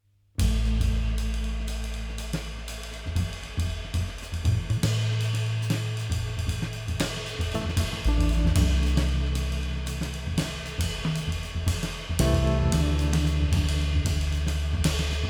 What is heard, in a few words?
Sneaking Suspicion Jazz Improv; Narrative Mind